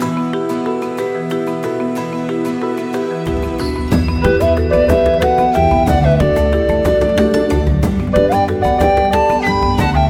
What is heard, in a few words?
Whistling Winds loop